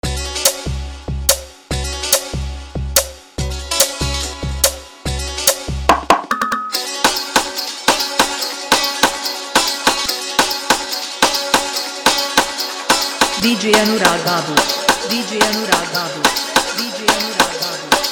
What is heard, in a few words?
Jhan Jhan Bass Dance Mix